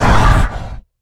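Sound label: Stealth Attack Grapple; Evolve; Goliath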